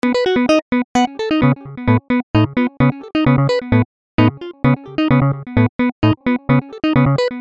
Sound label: 128 Beat 4 bar